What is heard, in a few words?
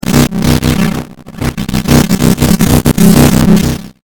detune